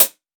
natural hihat A key

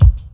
short kickdrum sound C key

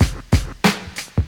103 bpm 2000s hip hop drum loop f sharp key

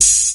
damped hat one shot g sharp key

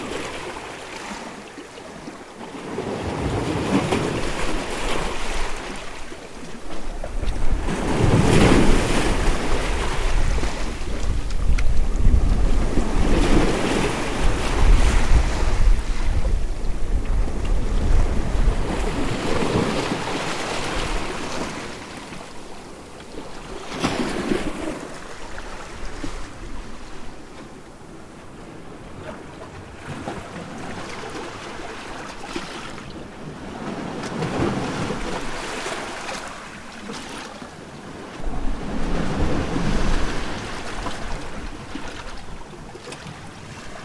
water glugs and splashes; water-drop

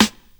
shiny snare drum A key; tuned snare

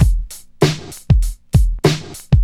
98.1 new york boom bap drum loop F

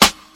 00s natural snare one shot g key; Duration 0:01; Channels Mono; snare drum samples

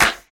clap sample g sharp key; single hits one shots

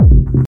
pushing steely techno kick; single hits one shots; kick drum samples

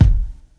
rich low end kick one shot F sharp key; kick drum

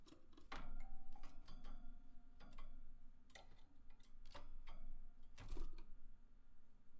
bird play in birdhouse wooden toy; soundscapes

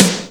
crunchy snare drum F sharp key

